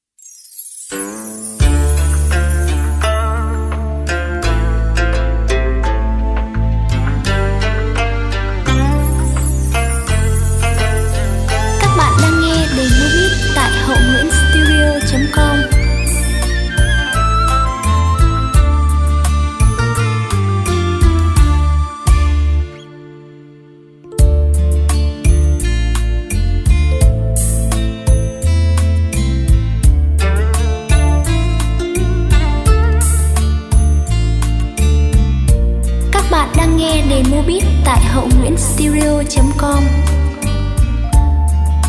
Hoi tuong beat

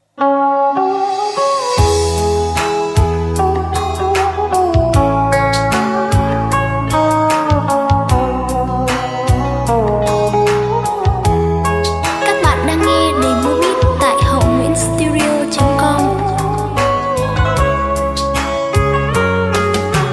Meo hoang beat

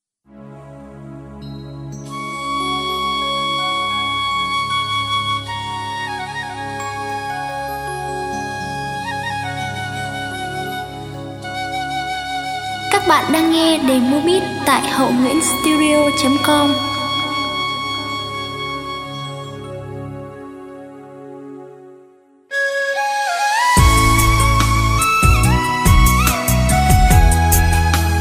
beat

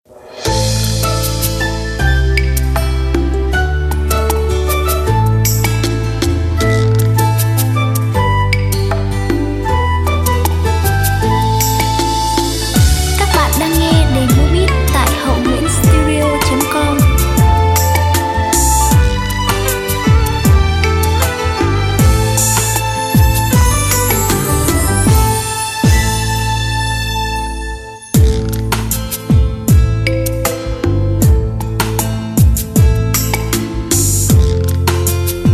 Dong thu muc tim beat

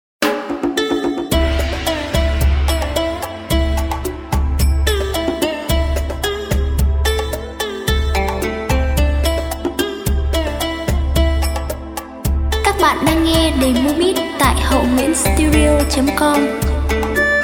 beat

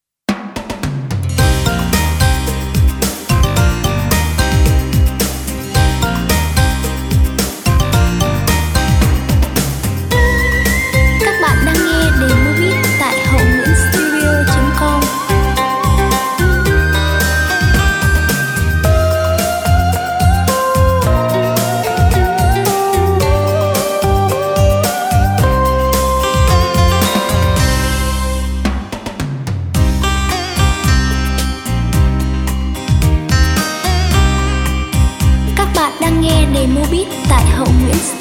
beat

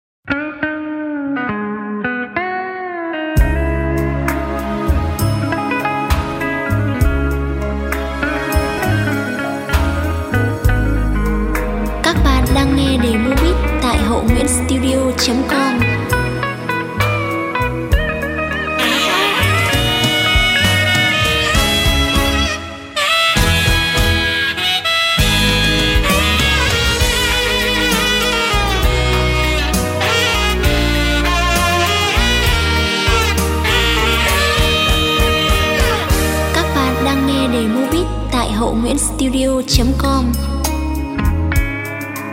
Tien beat

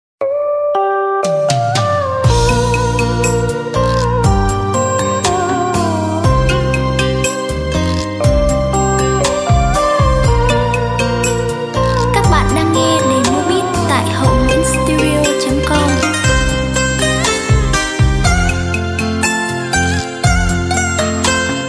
beat

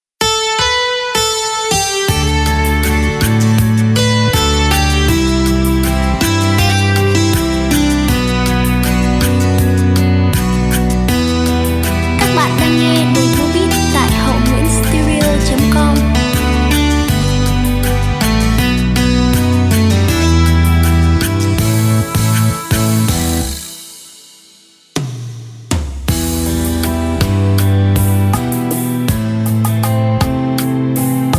beat

